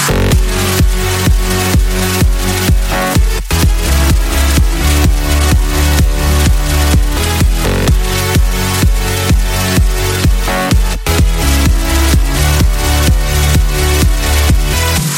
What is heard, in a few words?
bass drop